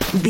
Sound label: footstep grass walk